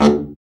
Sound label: BRA BARI, Bariton Sax